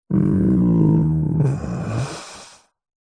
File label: human male light moan groan quiet ill weak pain; zapsplat